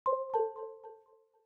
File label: Windows Notify System Generic